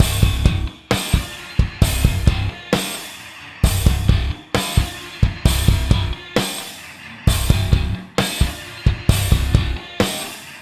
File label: a learning loop